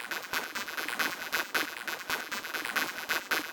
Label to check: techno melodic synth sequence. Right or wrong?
Right